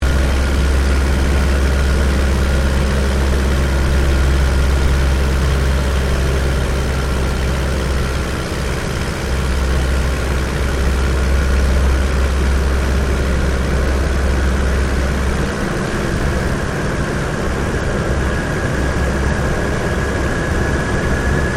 AIRCRAFT DH82A DEHAVILAND TIGERMOTH FLYING INTERIOR LOOP; RAAF Tigermoth; Airplanes (Sound Effects:Vehicles)